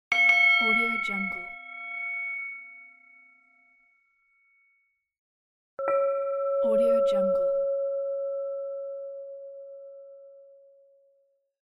ships bell

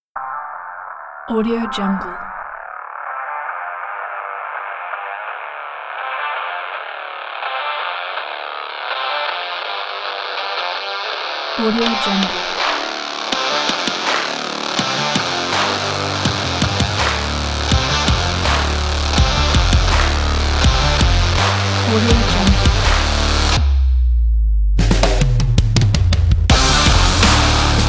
hard rock drive fuzz intro

